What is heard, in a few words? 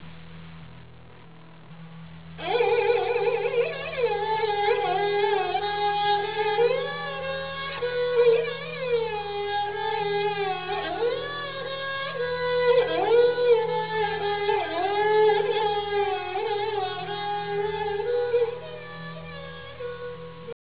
sarangi